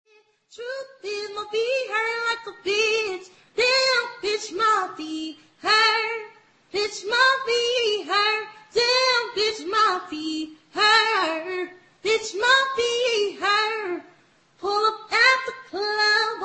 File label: singing acapella